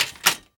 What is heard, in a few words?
UI Item Weapon; Inotia 3